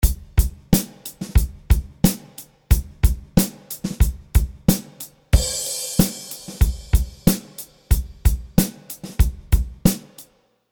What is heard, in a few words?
Drums Chamber